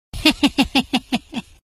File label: hehehehe